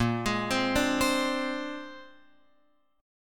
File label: A sharp 9th A sharp Guitar Open F; arpeggiated